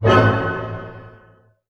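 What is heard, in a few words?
A diminished pianissimo, orchestra hits; Sound On Sound - Peter Siedleczek's Orchestra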